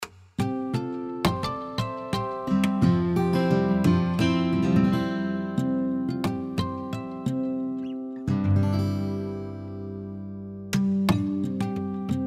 PICKED HARMONICS

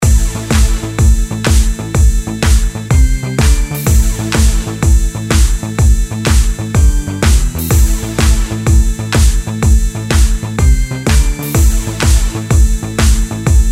ROCK BOUNDS

